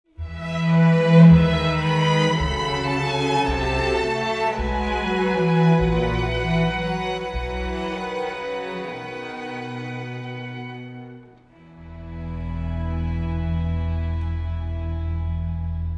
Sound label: String Section; Urbina